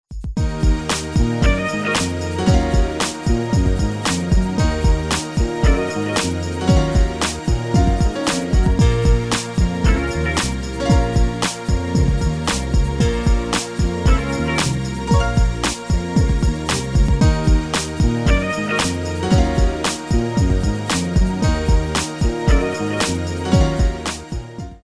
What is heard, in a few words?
smooth jazz jam